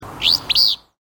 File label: fizz